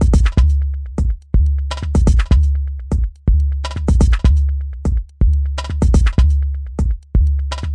124 bpm, newtech deep kick

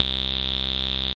filtery synth bass; SYNTH KEYS ETCETERA

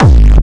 psycore kick mutilated; drum machines

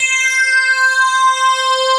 Synthesizer Middle C Notes, Lead

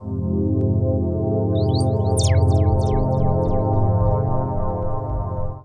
interstellar, pads

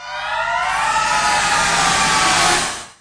Electro FX; Ueb HE Electro FX

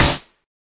SNARE, Volca; STYLE KITS (Hip Hop, Etc); WallsVado Volca Sample Pack

